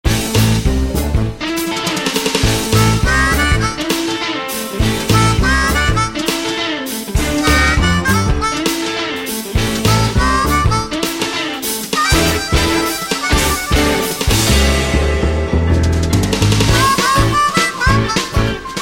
Dixie Pick

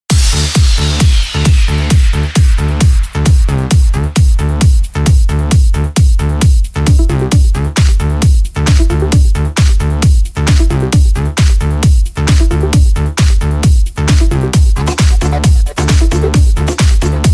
Dance Floor Remixes

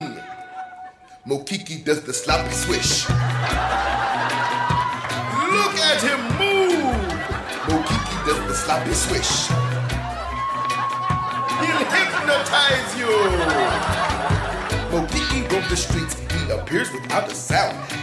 sloppy swish

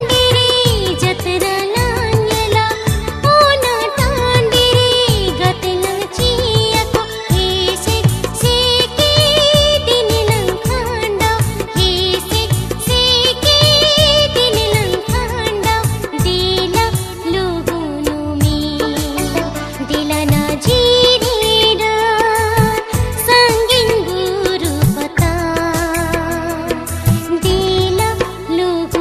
Santhali Tones

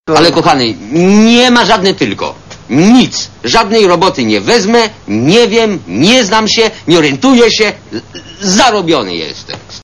Sms

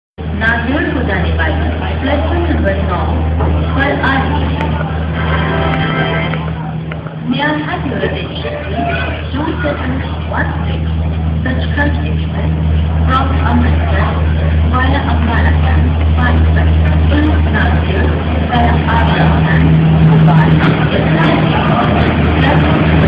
Indian Railway

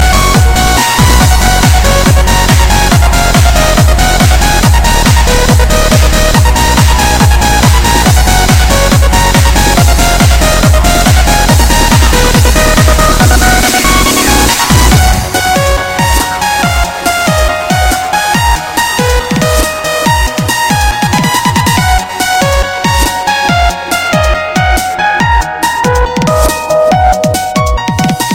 Trance

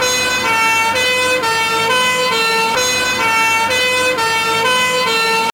British Two Tone

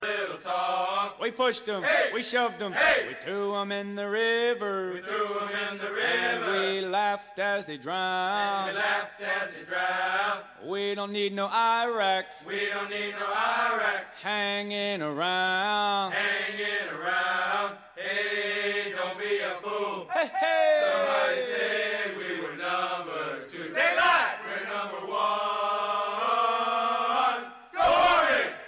Army Cadence

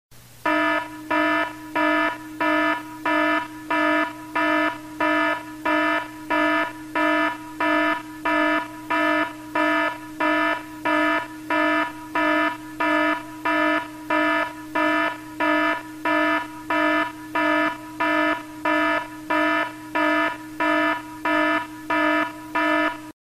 Factory Alarm Sound

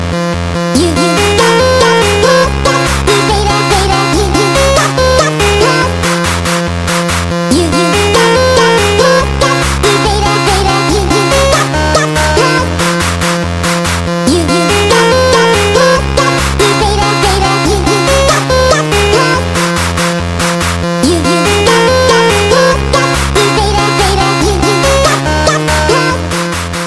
House Rave

